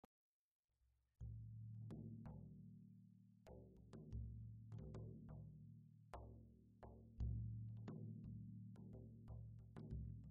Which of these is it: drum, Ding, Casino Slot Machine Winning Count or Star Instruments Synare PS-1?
drum